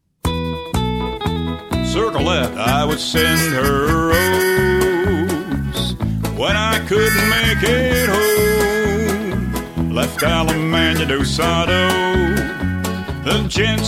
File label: vocal